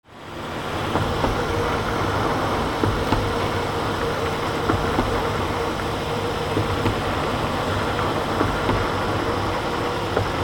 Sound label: INDUSTRY